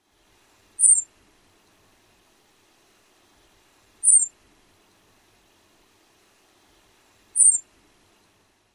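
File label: flight notes, bird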